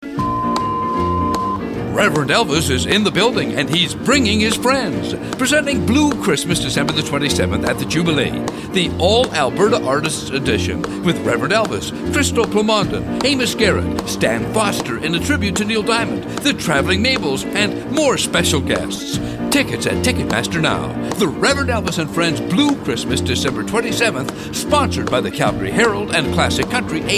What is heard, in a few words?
Tone